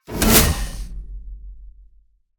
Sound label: Industrial steam release impact